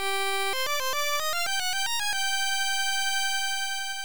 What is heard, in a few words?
Pegasus Flute; rygar